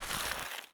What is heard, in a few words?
gravel slip